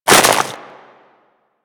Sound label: Hammer Pull; Warframe; Grineer Hammer Boss; Foley